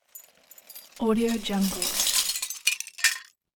glass debris; hollywoodedge

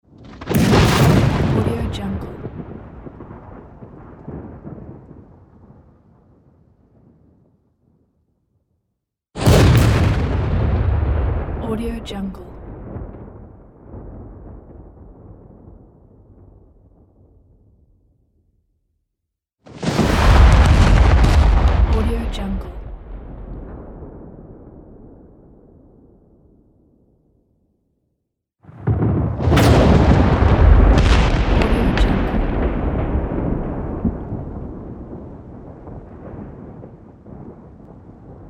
thunder; wavbrulee

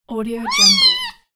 cat emotional meow

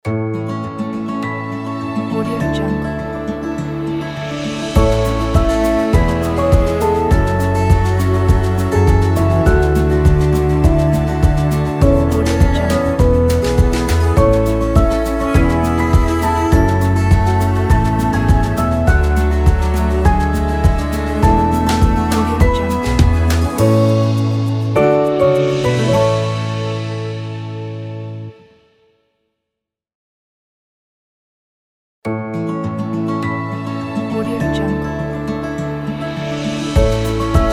happy easter logo

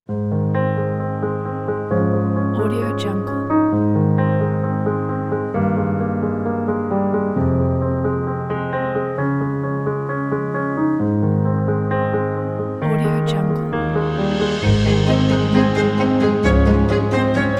peaceful piano inspiration; neoclassic

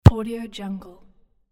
single soccer kick

